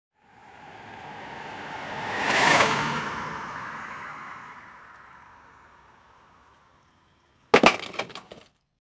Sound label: car crash sound; emergency